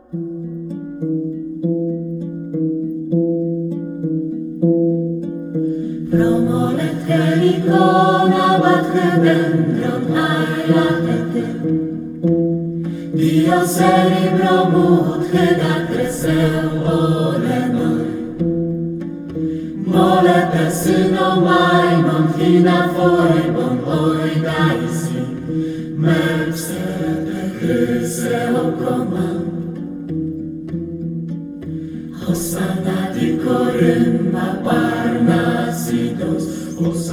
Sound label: ORCH; Processed with ORCHESTRA filter; Promoleth Helikona – one of Delphic hymns